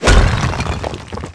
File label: brick impact; Tony Hawk's Project 8; XBOX